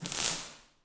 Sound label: spark republic cruiser; Star Wars Obi Wan; XBOX